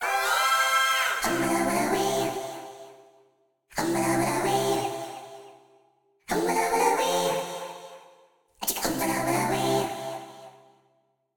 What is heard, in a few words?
music humbalawalawaeya; serengetikings